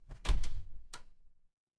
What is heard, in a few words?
door open